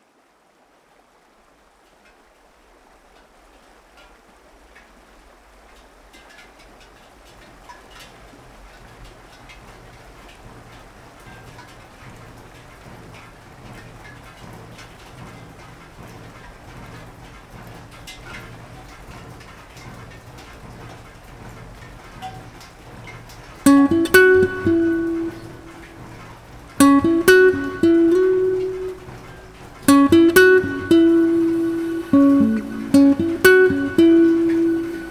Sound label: Rain Melody